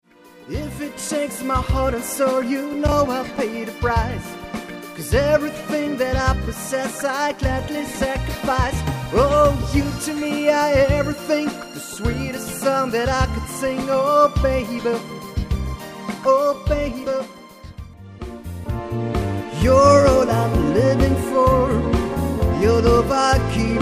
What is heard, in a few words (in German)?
Hochzeitstorte und romantische Musik